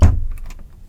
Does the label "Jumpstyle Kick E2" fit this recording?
No